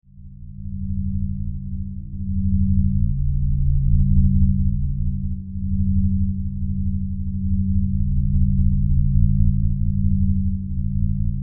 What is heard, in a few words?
Science Fiction Magnetic Field Drone; Sci-Fi